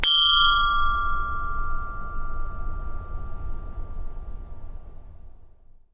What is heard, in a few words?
chinese bronze bell front; sackler